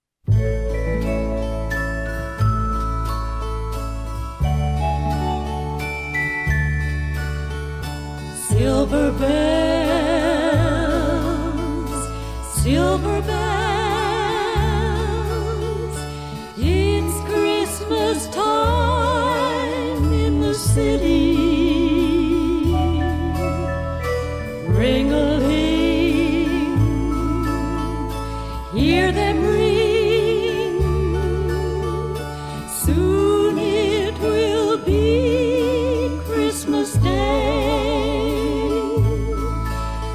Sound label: Orch and Chorus